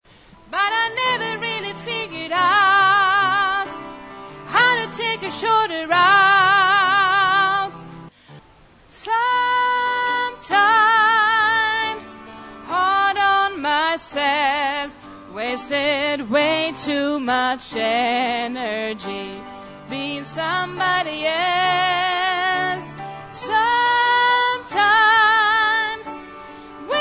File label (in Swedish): sopran